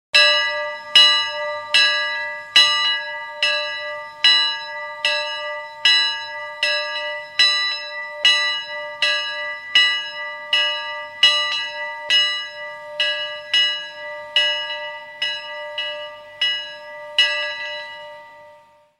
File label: Bell Amer Steam Eng